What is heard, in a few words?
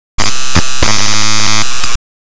glitch